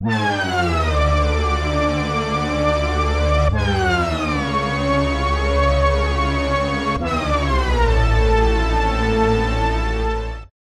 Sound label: lead hoover; dominator